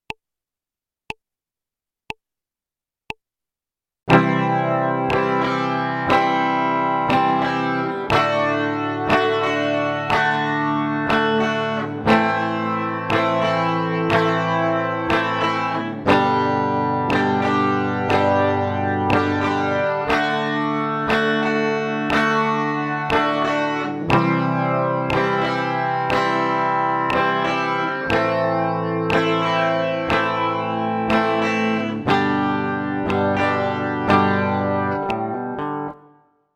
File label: melody verse